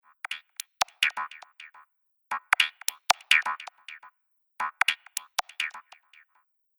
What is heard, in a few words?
Quirky Beats Loop 140 Bpm; Percussive And Rhythmic; Seamless loop; Andrew Fleming